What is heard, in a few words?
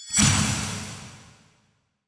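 notification killstreak bonk and shine; war ui; esmteam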